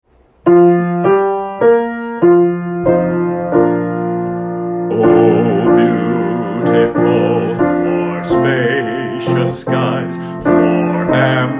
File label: bass america the beautiful bass vocal; patriotic songs